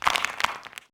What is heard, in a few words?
Ceiling Break, tunnel; breakable